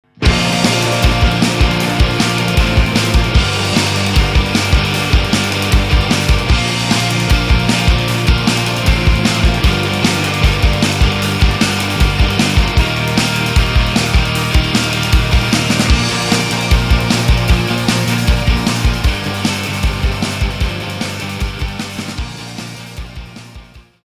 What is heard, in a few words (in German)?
instrumental Refrain